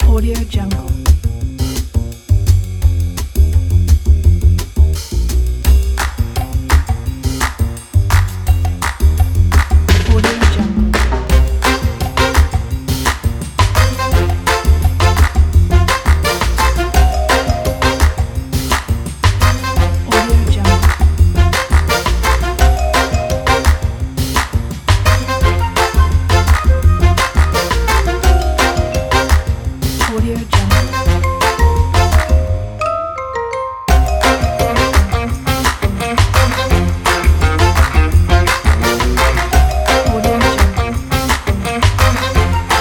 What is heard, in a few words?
jazz funky cooking